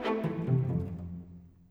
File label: Strings; Sonic Foundry (Sony Creative Software); Orchestral VOL-2; Modern 12